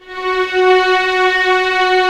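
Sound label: STRINGS F#4 FF, Orchestra; Sound On Sound - Peter Siedleczek's Orchestra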